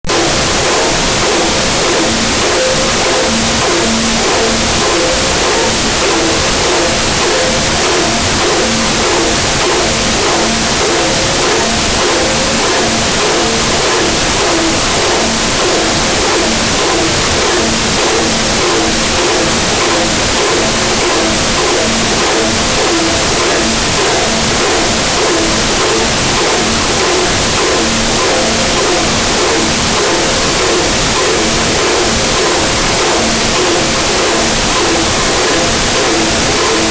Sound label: Clock Wind Up; 1001 Sound Effects; Foley